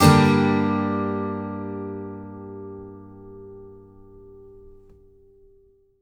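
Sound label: acoustic guitar G minor up, left; Country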